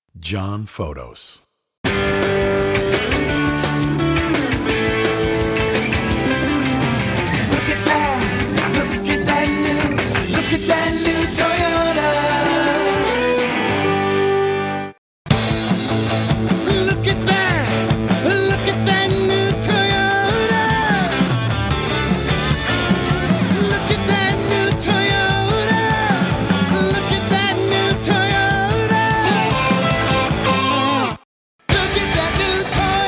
jingle; TOYOTA

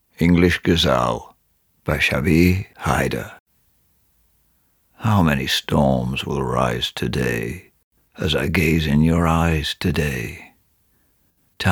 English Gazal

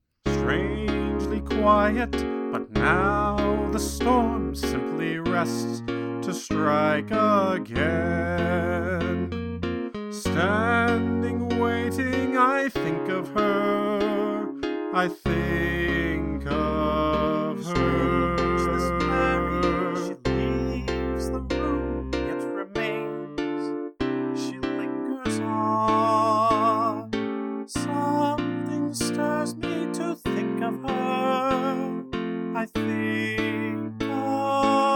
duet

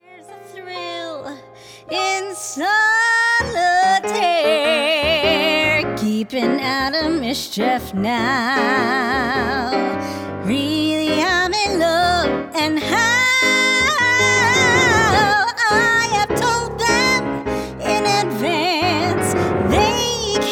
Singing

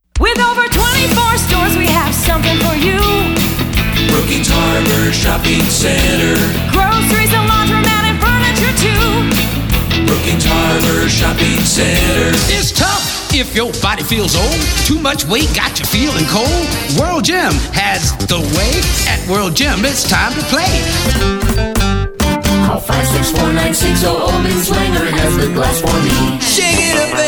jingle

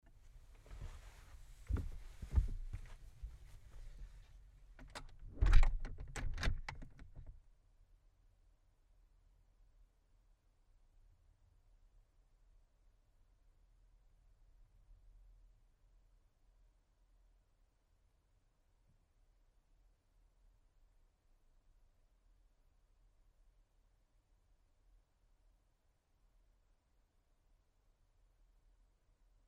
noise floor

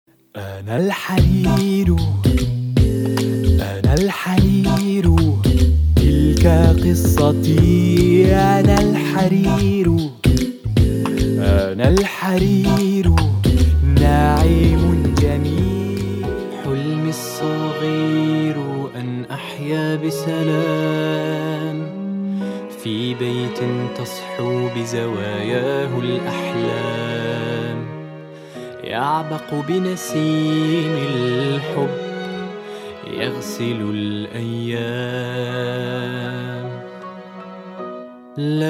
Singing

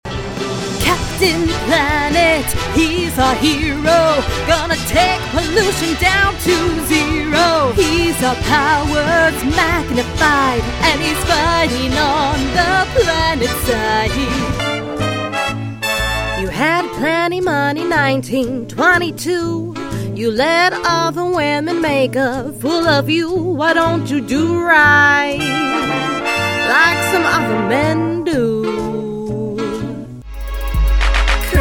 Singing

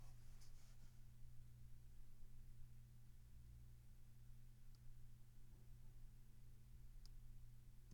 room tone

